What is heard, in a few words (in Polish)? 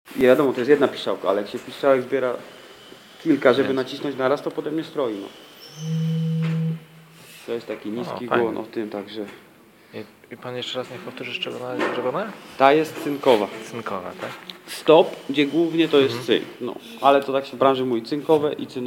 organy; remont orgnow; Pawel Jurasz